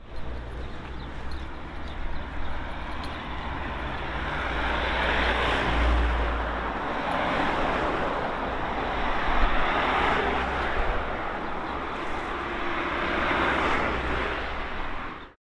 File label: Harbour traffic; whitstable